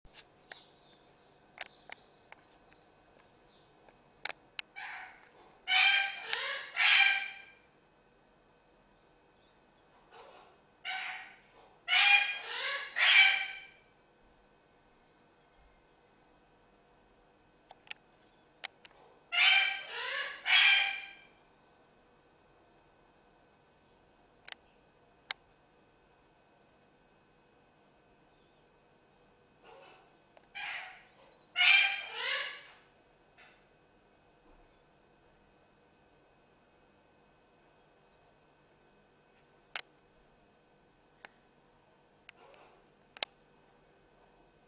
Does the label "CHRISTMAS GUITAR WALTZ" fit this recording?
No